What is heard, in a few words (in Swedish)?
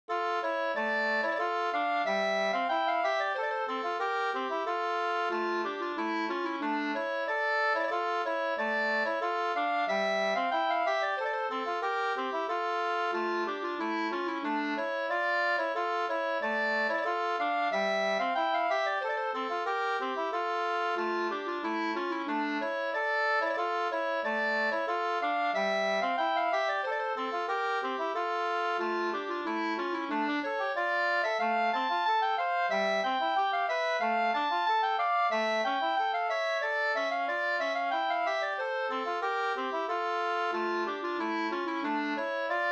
ganglat; fr Skog